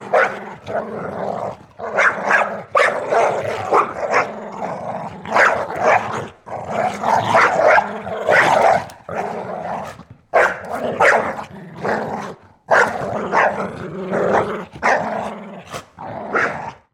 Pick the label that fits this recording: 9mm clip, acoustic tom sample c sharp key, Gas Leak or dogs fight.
dogs fight